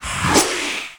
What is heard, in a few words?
CROOK WAR ATTACK